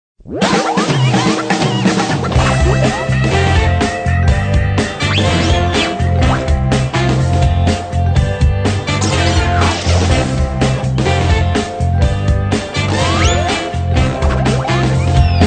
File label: Groovy Kids Long Short